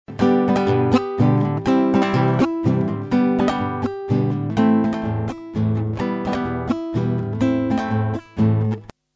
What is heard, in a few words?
Test Takamine